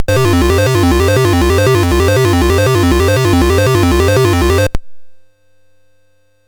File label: Dragonworld sid MOS6581R4